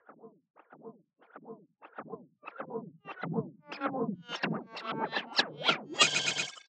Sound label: Movement; 99Sounds; InterSpace